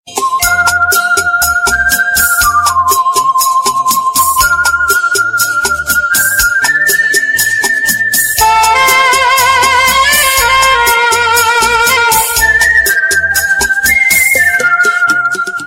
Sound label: flute